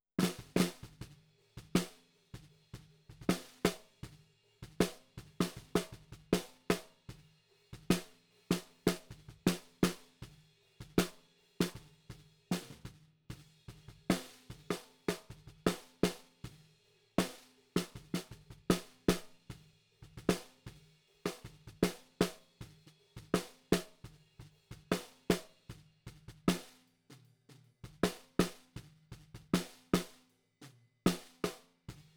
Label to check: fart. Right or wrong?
Wrong